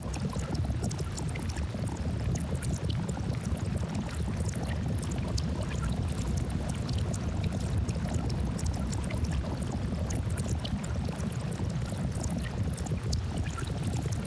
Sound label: Water Sphere Fly Loop; TANK; 3Souls